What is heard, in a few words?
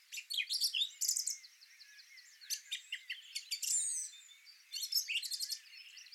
Bird Solo, Garden